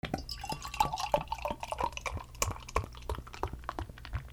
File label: KITCHEN